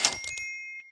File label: Shop Upgrade Buy